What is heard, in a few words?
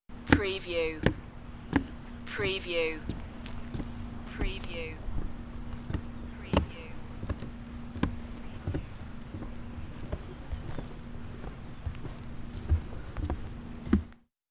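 Boots Wood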